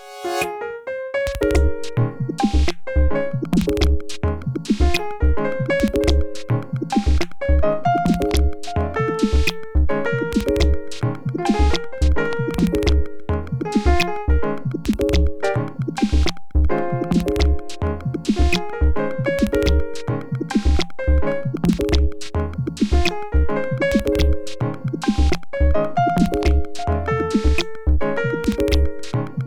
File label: Miniature in F Minor